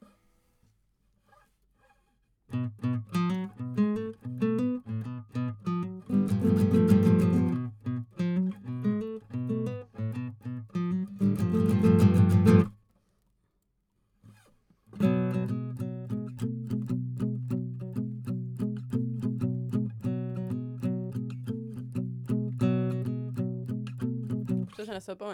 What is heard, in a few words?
GTR